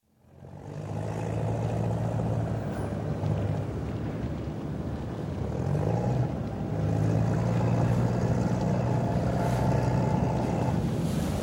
Istanbul, ferry starts into constant run, exterior acoustic with occasional ship's siren, arrives Uskudar, ramps lowered; Boats; BBC